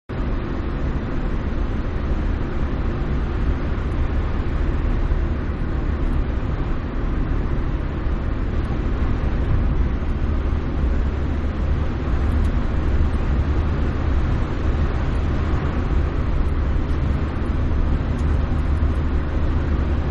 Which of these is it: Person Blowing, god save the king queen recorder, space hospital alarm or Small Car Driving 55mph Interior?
Small Car Driving 55mph Interior